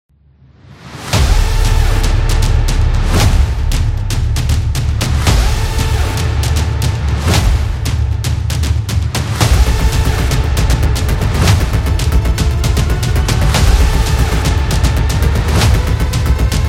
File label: Epic Intro; sport rock